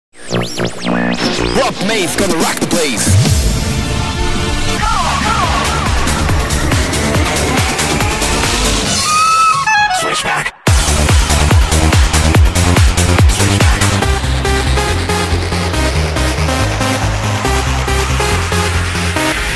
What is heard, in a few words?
Ectro Mix